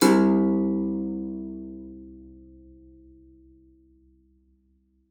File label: spinet; Xsample VOL-7